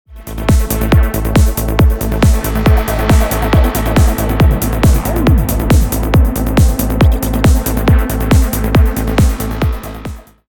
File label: cl hats